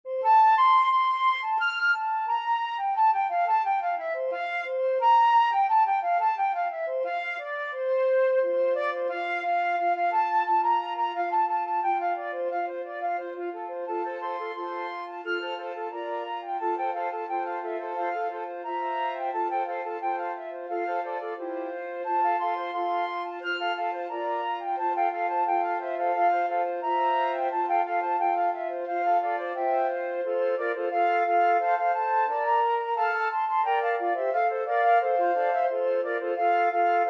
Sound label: flute quartet